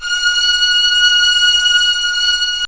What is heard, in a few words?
violin, strings; Zoom - Release 2; Active Software; akai